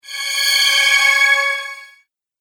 Spell Caster Flashback Whoosh

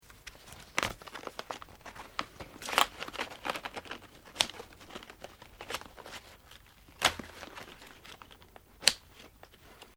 SKI SNOW BLADES STRAP ADJUST CLOSE; Snow Skiing; Skiing and Snowboarding; Sports

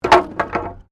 Wood Fall

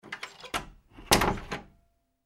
household door wooden internal shut; Door Shutting - Wooden Internal Door Closed; Miscellaneous Doors; McKinneySound

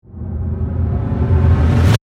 Riser Flange, Riser, Suction, Reverse; Production Element, Imaging Element, Accent, Transition; Spacecraft; BlastWave

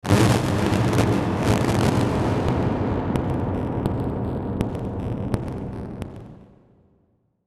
Electrical Burst Hit Static Crackle Reverb; Blastwave

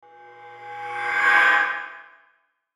METALLIC SOFT, Metallic Soft Reverb Swell; Metallic Sound; Blastwave

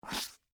Pumps Scrape, Footsteps, Peep, Toe, Wood, Surface; BlastWave

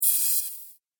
Droid Gas Sound, robot sound effects; Sci-Fi Robot Sfx; Andrew Fleming

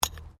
Digging Small Handheld Tool Rocks

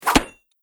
Sword Strikes Wooden Shield; Battle Sound Effects

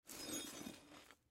Pipe Pick Up Dirt Tool Wrench; Machinery; Foley

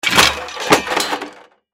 Crash Metal Wood Pipe Debris Drop; Crashes (Sound Effects:Objects); Blastwave

